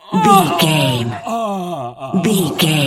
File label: voice male b death low